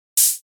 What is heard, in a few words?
Hat, gentle hats hi hats and shakers; basic wavez; gentle hats vol 2